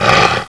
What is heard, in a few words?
gonome pain; svencoop